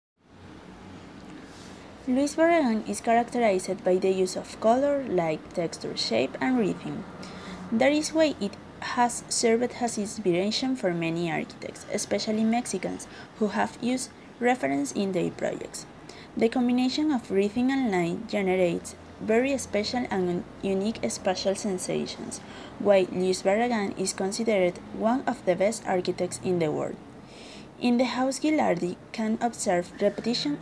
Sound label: rhythm